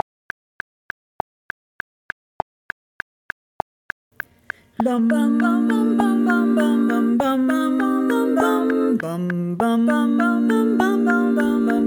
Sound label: sand beg end clicks; decades medley